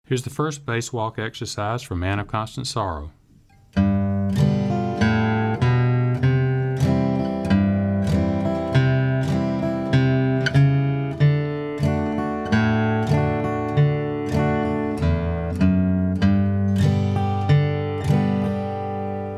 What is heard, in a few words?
Bass Walks Exercise